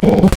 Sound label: Cat, Wavetables